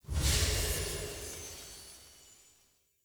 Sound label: UI Faction Choice Open